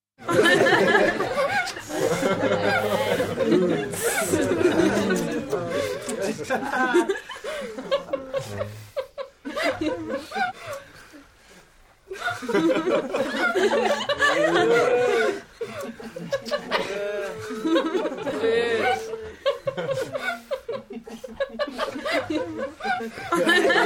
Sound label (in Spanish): adolescentes riendo; efectos